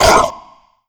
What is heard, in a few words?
vox abandon grunt, zombie